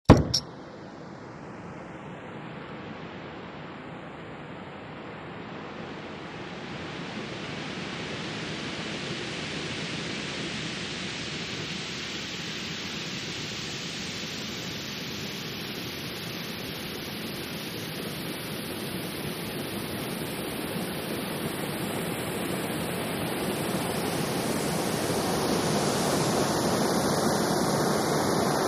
Acetylene Torch Pop On, Increase And Steady With Hiss, Then Off